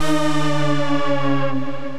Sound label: Student Saws, Synth Essentials, Effects